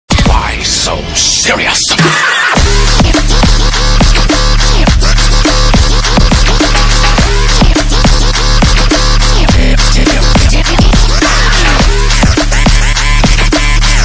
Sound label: Remix TRAP TRANCE